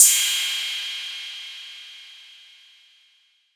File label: big ride sample a sharp key; cymbal drum samples